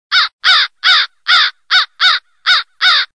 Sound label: Single Excited Crow